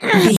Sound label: voice female effort tired